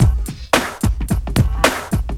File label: Dance Mega Hip Hop Electro, 110; EastWest